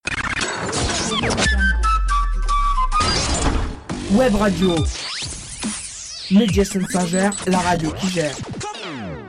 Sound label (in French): jingle web radio